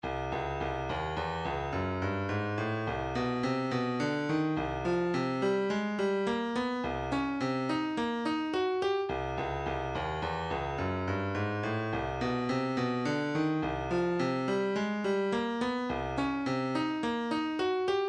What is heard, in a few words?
109 hihat